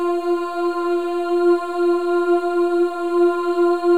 OO F4, WOMEN; Spectrasonics; Symphony of Voices; WOMEN OOS

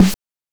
BANK1 SD, NES DPCM; MIDINES

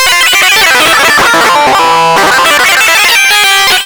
dist loop

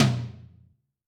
RoomP L, Tom, Yamaha DTXpress-II

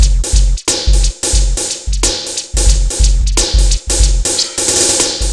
Synth Loop, BPM 90

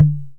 DH, Indian Percussion Series Tabla; Mango Loops

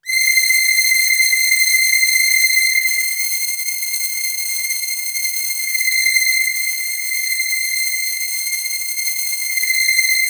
C5, Synth Line; 90 s Sample CDs; NiceBeats; SynthLine VOL-16

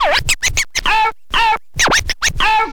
scratch short, Vinyl scratches

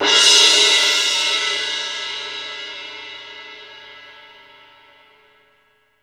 C18 MF, orchestra hits; Best Service; Peter Siedlaczek's Advanced Orchestra

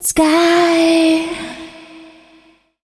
FEMALE, Dance Vocals

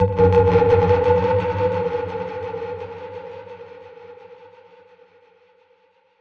Perc Hit, dub percussion; musicradar

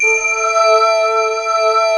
AMBI PAD

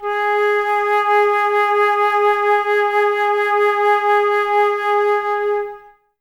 Flute, Wood Winds; Flutes; Miroslav Vitous; Woodwind Brass Ensemble

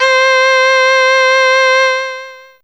Vln Lo C5, HiString

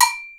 AGOGO, PERCUSSION; Crypton - DrumBase5000

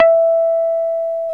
Jazz Guitar

